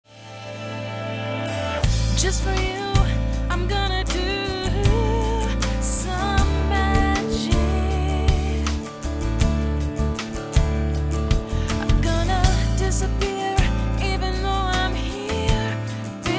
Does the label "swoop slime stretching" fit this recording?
No